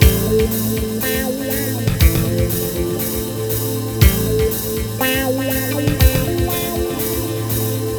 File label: slow wah